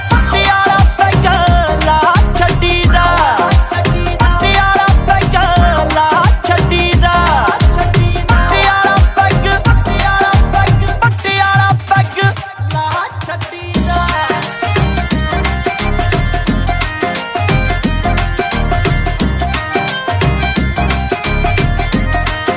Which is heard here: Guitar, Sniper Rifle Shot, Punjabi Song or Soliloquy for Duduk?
Punjabi Song